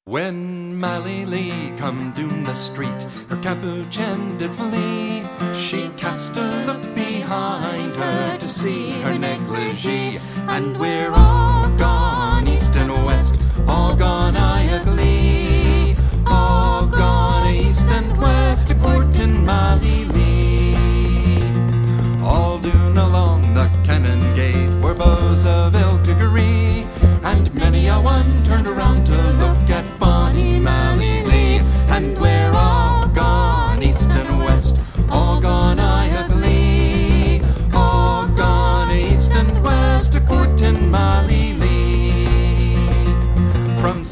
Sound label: Celtic Band; Houston Celtic Band